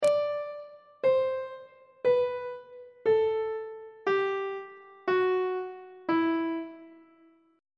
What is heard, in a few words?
e aeolian mode descending on treble clef